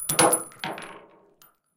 shell drop single; guns; tanks; War Thunder

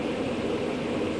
Technic Engine; DCS

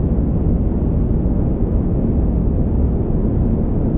hydroplane idle

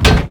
damage hits explosions, Warships

